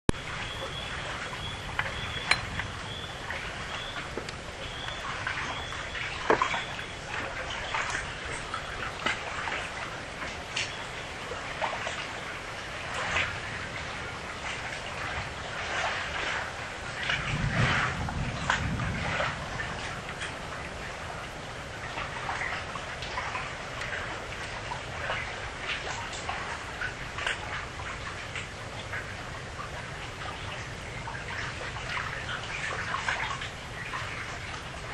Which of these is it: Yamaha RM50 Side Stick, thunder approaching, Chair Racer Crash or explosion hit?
thunder approaching